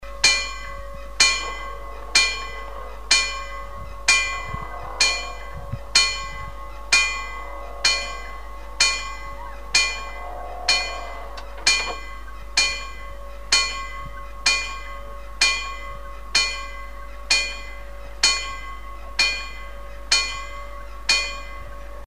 ding; Jernbaneoverskæring #1; Denne lyd ledsages af røde blink ved danske jernbaneoverskæringer.; Hjerm Station

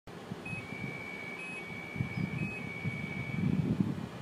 door release

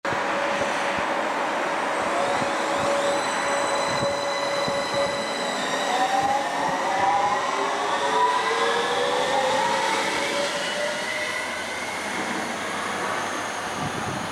Metro G1 Depart Ground, Metro G1 afgang; Emil Glentvor, Oktober 2022, Metro

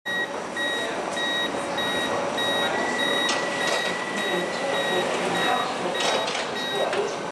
Metro door close ground, Metro G1 dørlukning; Emil Glentvor, Oktober 2022, Metro

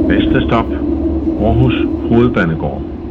Aarhus H; Letbane: Århus H #1; Jørgen Leth udkald. Næste stop.

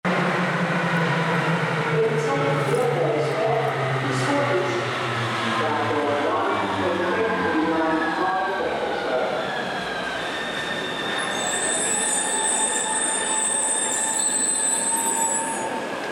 Metro G5 Arrive, Metro G5/G6 ankomst; Emil Glentvor, Oktober 2022, Metro

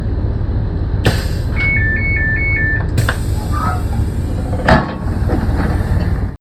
ic3 door open; IC3 døråbning; Nikolaj Barfoed-Thaysen, Juli 2022

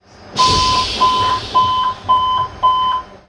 S-tog door open; S-tog (2. gen) Døråbning; Andre Baxter

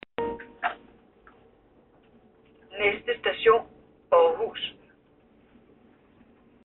aarhus

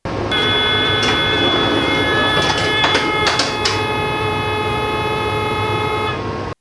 stog door open

